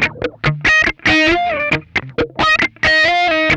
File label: OCTICK, Funk Guitar; 90 s Sample CDs; Zero G